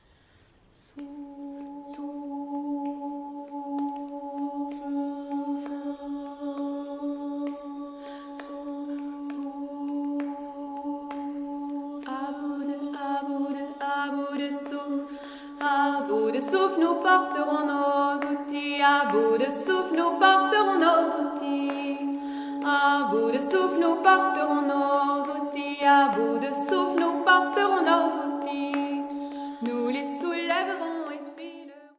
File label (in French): La Pluie